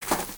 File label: Footstep; Lego; Strombie; creatures organic